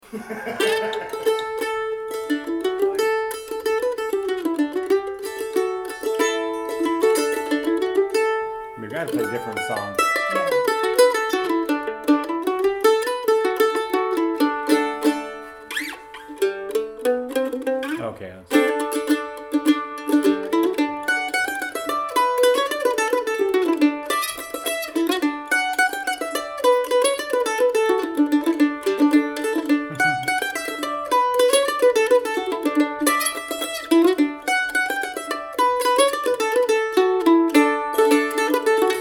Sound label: Uke; Jett Instruments Followup